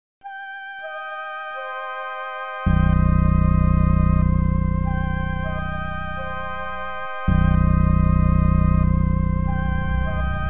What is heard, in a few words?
70's Beat Box Sound